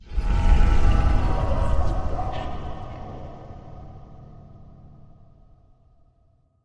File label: horror game magic ancient shamanic whisper instant cast spell